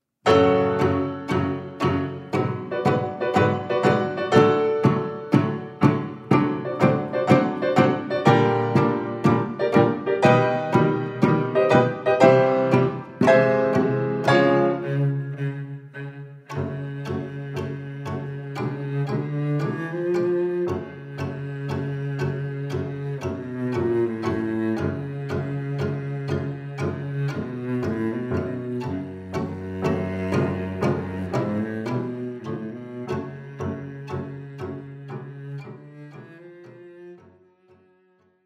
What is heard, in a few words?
Foxtrot (Blues), PIANO TRIO JAZZ SUITE; SHOSTAKOVICH